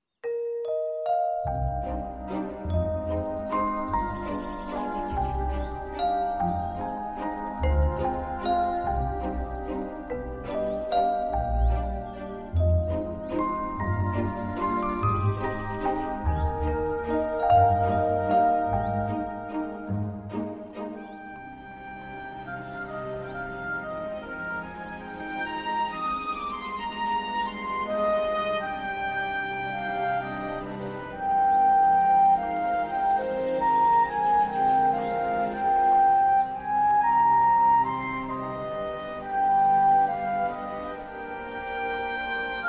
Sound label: waltz alt; ponyTown